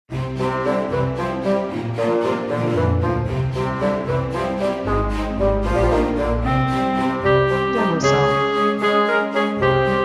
Whimsical Story

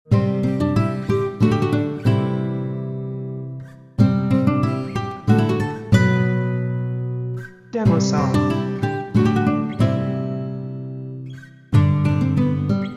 Loop Guitar Flute

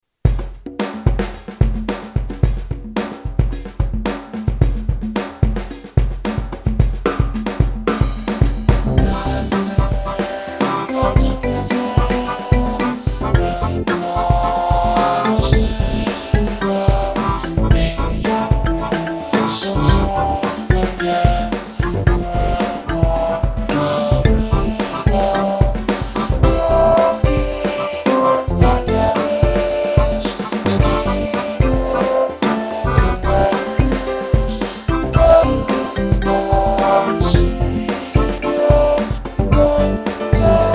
Funky Remix

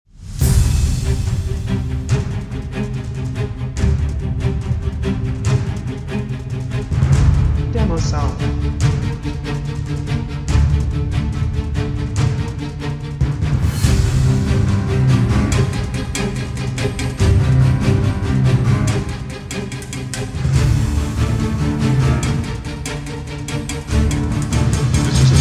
Revenge of the Night Stalker Big Tension Risers

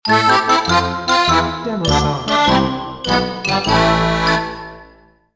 Xylophone

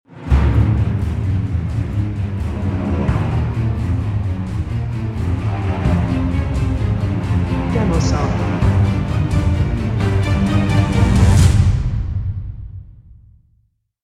Threatening Tension Builder

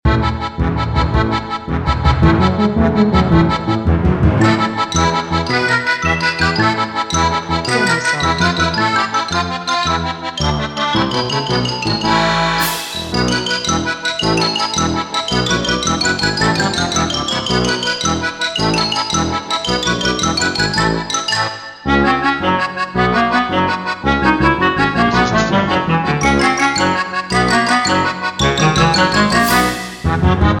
Happy Hectic and Fun Loop

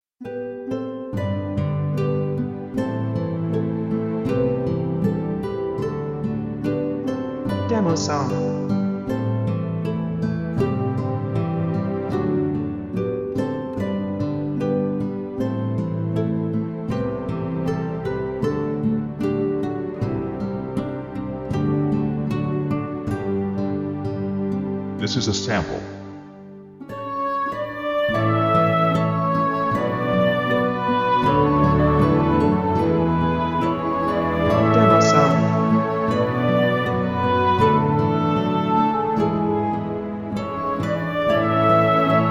Guitar English Horn

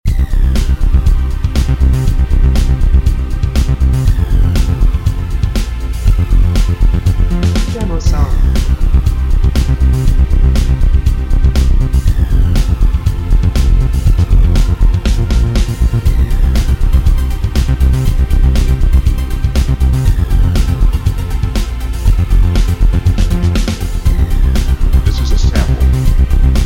Space Synth Xtreme

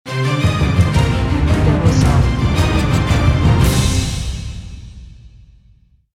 Epic Intense Power Happy Ending

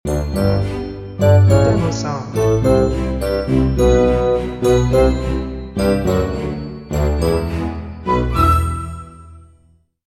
Stinger

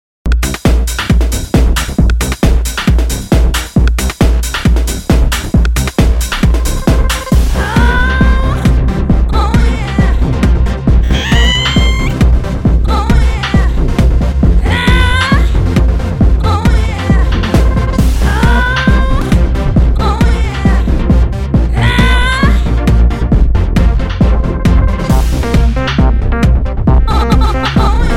Techno with vox; Made with Cubase, Vanguard and Nexus VSTI

